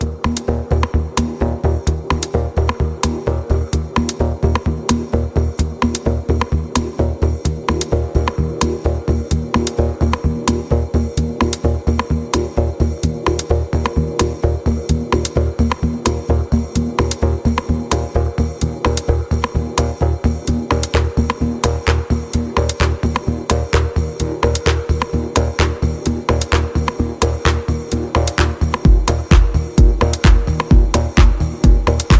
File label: Techno